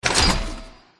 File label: Click; the great ottomans strategy battle for throne android